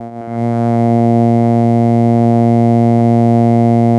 Bass drone A#, Circuitbend; Drones - Bass; 99Sounds; Mangling Audio

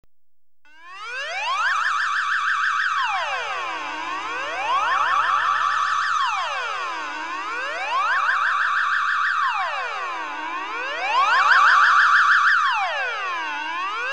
Emergency Alarm, Electronically Generated Sounds; BBC Sound Effects Library

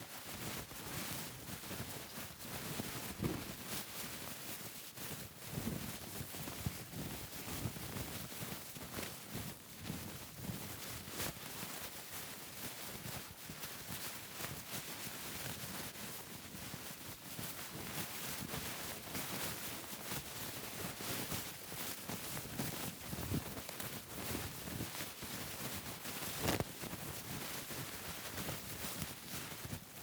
Moving Loops, Paper Towel, Loopable; Badlands Sound

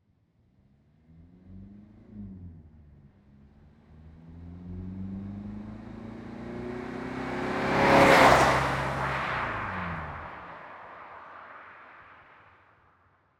Citroen C2 Pass By

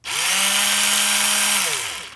power drill; Sound Design Tools; Raw Foley

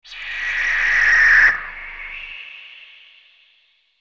Aliens Monsters; FXHome

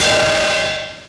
Ride; 99Sounds; Dark Engine; Burnt Hits; Hats

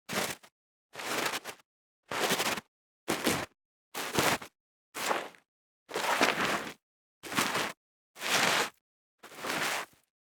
Snow Footstep Light Slow

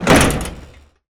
demolisher metal impact, robot; BluezoneCorp

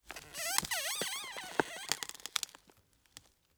Wooden log mangled with metal bar; Wood Impacts; Red Libraries

